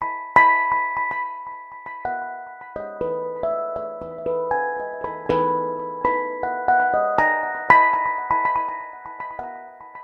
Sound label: Samsung ringtone Dawn